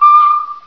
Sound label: fill